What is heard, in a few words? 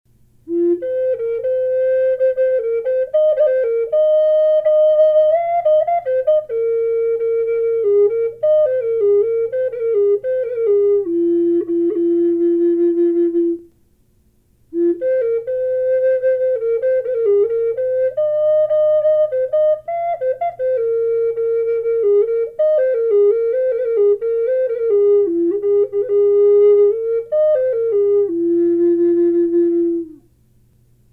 f minor, flute; orca whale; collector flutes